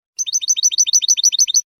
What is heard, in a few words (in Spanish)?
bibleos acascabelado, campero